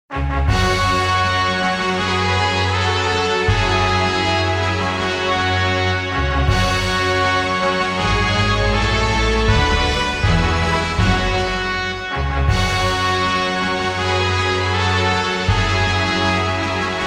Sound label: musica